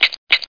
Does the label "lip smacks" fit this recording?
Yes